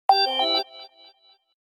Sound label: Sony Watch Beep